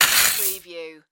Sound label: Rake Gravel Metal